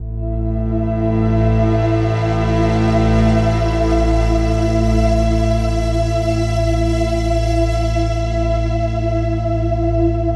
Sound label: Overlay Drone; Act3 TheView